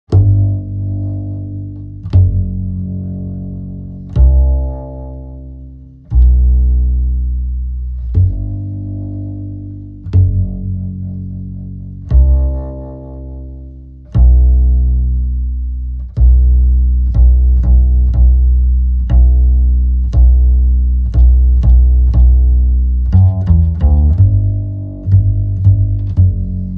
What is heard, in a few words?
Bass End Titles; Lady in the Lake 2024; series soundtrack